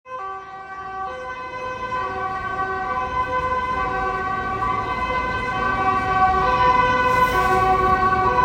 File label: Ambulance